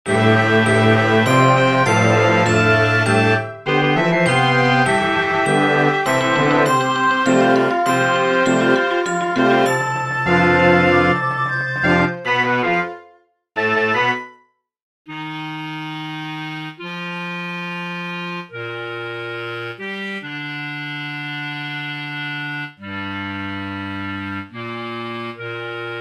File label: pirate bass